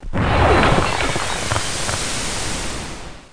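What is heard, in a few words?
sizzle; Total Chaos Nukem 3d Pack; duke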